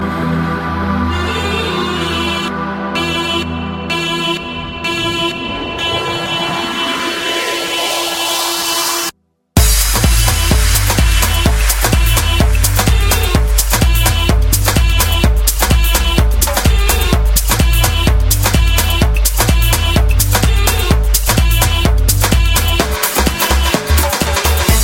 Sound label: Noisy Tone